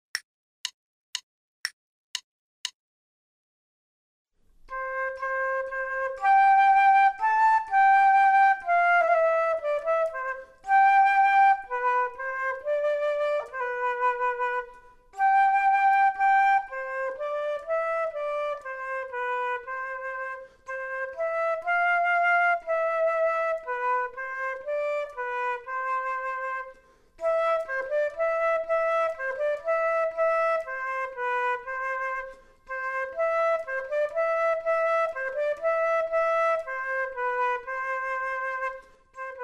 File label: Duets flute; Selected Duets for Flute Podcast